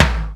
LO TOM; Crypton - DrumBase5000

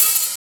OHAT; East West; Funky Ass Loops

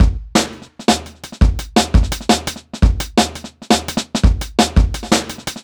E Beat, Electronic Beats; KJ Sawka; Drum n Bass & Beyond

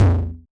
Yamaha DJX 2B, Hard Tom

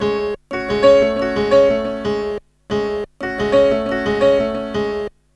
Hip Hop R n B; PIA FX; USB Soundscan vol.19; AKAI

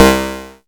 bass stabs, Drum Machines FutureRetro FR777

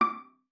D6, VIOLA PIZZ; Xsample VOL-5; 90 s Sample CDs

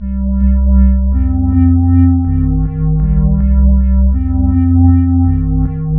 synth (turn); Sonic Foundry (Sony Creative Software); Ugly Remnants VOL-2; Knutrix Demo

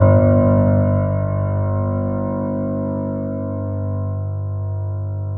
PED MF G, GRAND PED; Best Service Advanced Media Trax Modern Composer

